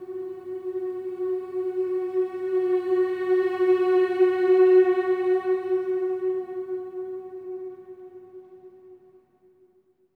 MM F#4; SWELL MMS

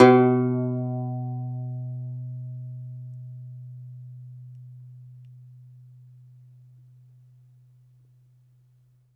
DOMRA, Plucked Strings, Segundo Domra

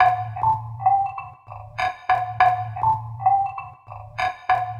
Mbira Bamboo, Acoustic Beats; Sonic Foundry (Sony Creative Software); Myths of Technology

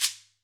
Ahfuche; Club-50 - Foundations Roland; Ahfuches

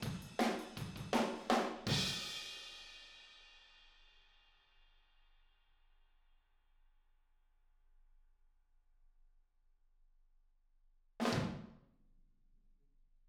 Outro Room ST, 162 BPM, Drums; Drums from the Big Room The Mixes; Take Your Toms Off

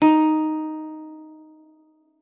D#4, guitar; Xsample VOL-2

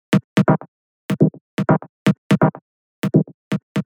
124 Cm Synth Reswung, Melodic Loops; Electronisounds

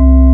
VIBES C2; Big Fish Audio; Synth City

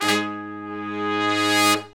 brass longs, Brass Sections, Horns; Roland LCDP06; R&R Horns; R&R Sect.sfz

